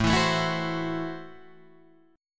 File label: A Minor 6th A Guitar Open D